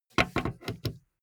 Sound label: car bmw e46 exterior gas cap close short